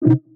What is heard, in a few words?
check off; #-K- Elaina [Less Animation]